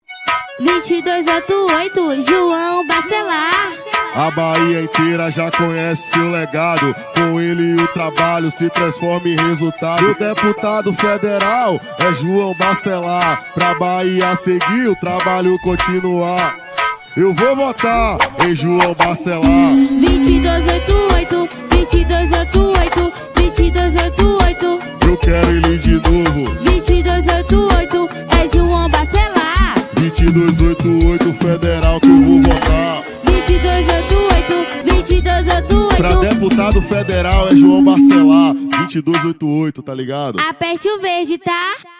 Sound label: jingle